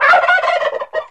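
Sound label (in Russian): индейка скрипучий близкий звонкий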